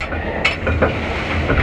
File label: MACHINES; ASSORTED MACH; FX